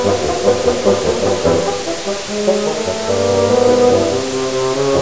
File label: bassoon